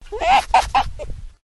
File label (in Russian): smeh enotovidnoj sobaki, Смех енотовидной собаки